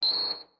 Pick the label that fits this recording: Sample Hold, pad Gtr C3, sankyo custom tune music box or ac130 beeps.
ac130 beeps